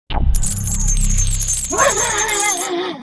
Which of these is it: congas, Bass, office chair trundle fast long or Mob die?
Mob die